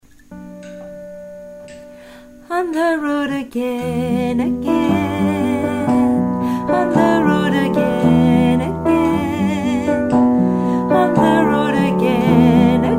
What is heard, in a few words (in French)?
basse